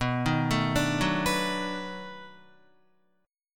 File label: B 7th Sharp 9th B, Guitar; arpeggiated